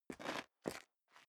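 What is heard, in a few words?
step dress boots concrete grit scuff, footsteps; schritte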